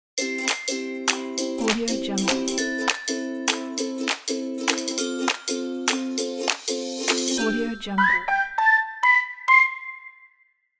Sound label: happy logo